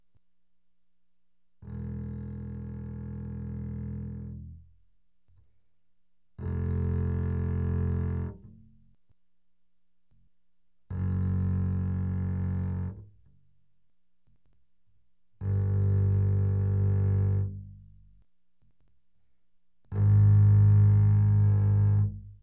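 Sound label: Bass arco fortissimo sul E E1 B1, doublebass; Strings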